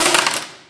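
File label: physics clipboard impact large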